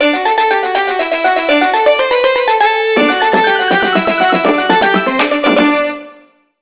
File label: Folkdance; 9210 CD